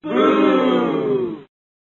bad shot sound

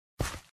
walk forward

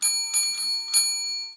bounce

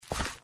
walk left

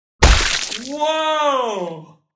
kill

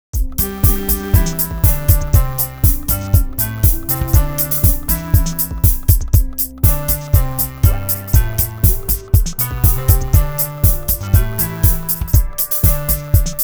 funny time

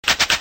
burrowing

